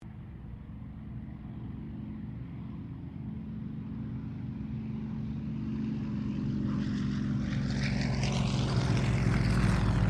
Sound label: AIRCRAFT T-28D TROJAN PASS